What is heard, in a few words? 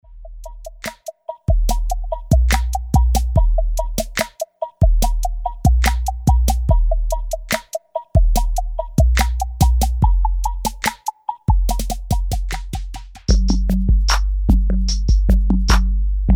boom drum loops